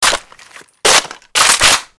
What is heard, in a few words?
sniper reload, weapons